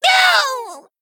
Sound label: Male Kobold Death